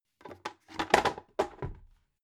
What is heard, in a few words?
bucket drop soft